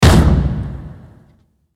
Energetic Upbeat Percussive Action Stomps and Claps Sting